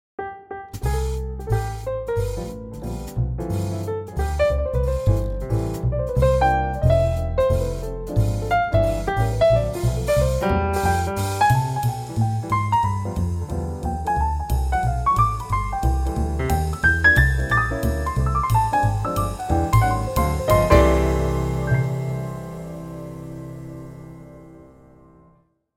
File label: jazz trio